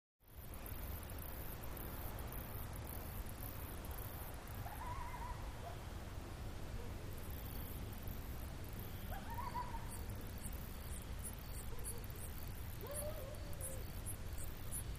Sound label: Night Mountain Crick, mountains